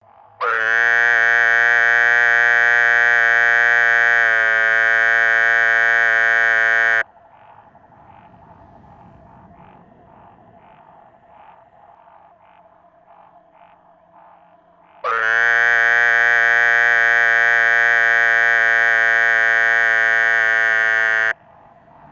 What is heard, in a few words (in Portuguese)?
Dermatonotus muelleri canto anuncio